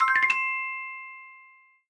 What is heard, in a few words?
chip minor